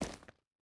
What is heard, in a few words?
footstep hide stones run; Rust